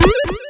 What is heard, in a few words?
hitsound retro; cstrike; tf2